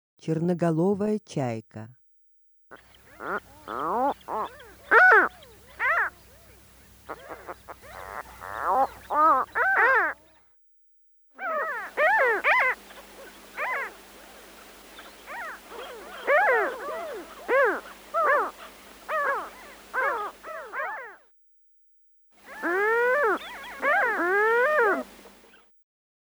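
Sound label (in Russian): chernogolovaya chayka golos, Mediterranean gull voice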